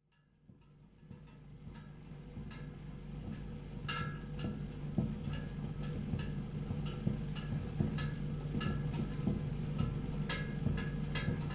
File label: siren; Sirens and Triga; Miloš Vojtěchovský alias zvukac